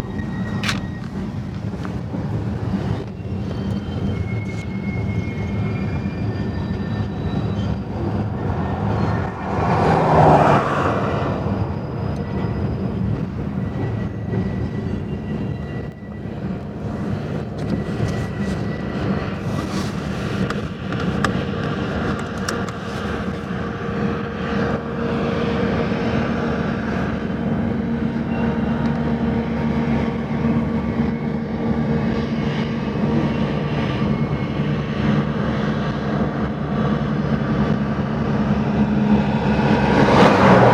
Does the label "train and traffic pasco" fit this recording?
Yes